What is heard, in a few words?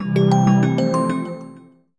success